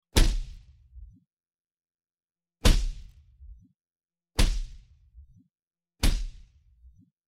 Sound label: Silenced Gunfire Sound; weapon